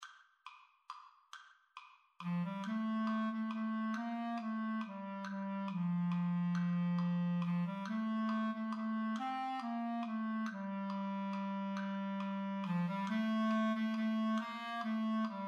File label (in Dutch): Mexicaans volksliedje onder